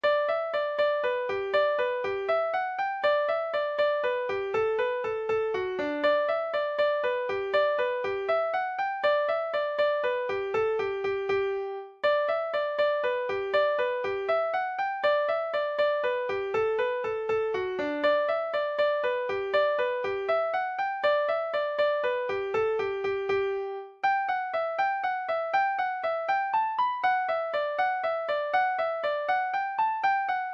irish american jig, session tune

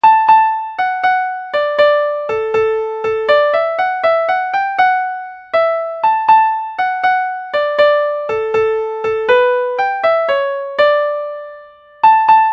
bugle horn

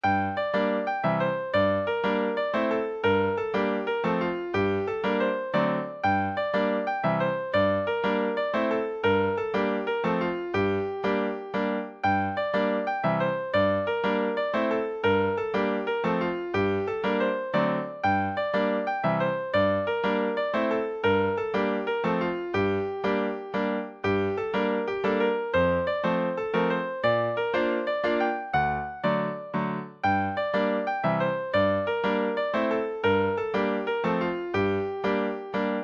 night geese polska

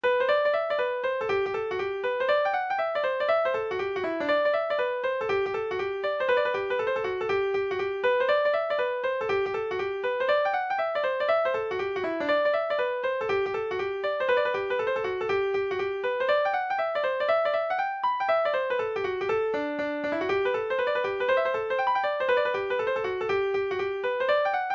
hornpipe; cloone; irish

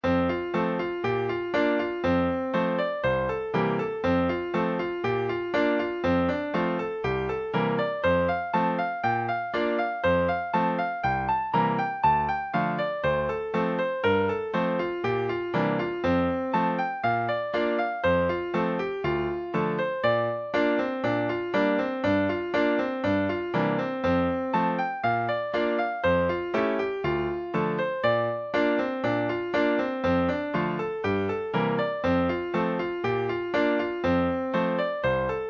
fiddle; frasers; scottish

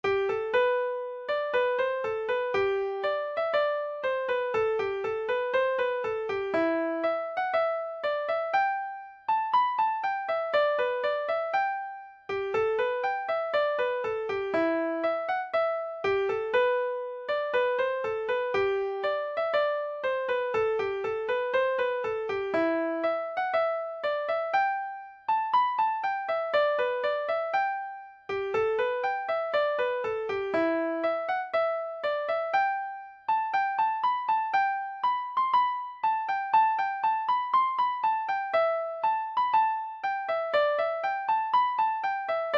dumbarton drums

